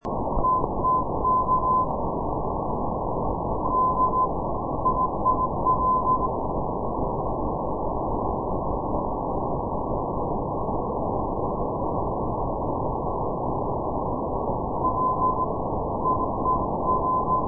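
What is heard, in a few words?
non-directional beacon + non-directional beacon; europe; bohdanivka; bely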